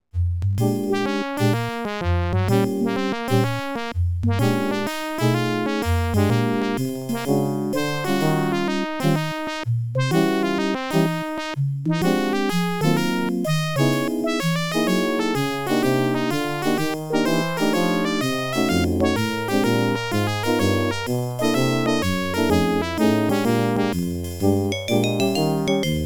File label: ADLIB OPL2